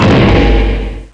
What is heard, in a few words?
clunk